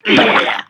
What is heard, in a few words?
bird stretcher garbage regurgitate projectile fire